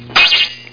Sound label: cartoon; animwave